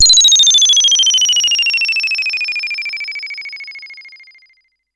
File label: Pollard Syndrum